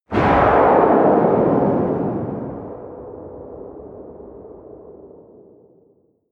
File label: missile large fire airplane distant